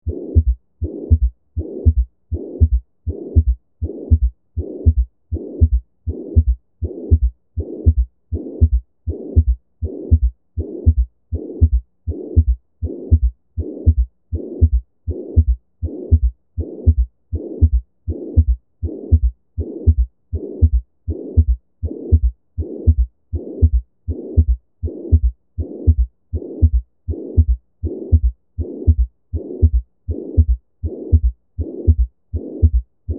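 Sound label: Apex, S3 & Holosystolic Murmur, Left Lateral Decubitus, Bell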